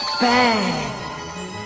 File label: Bang; Aido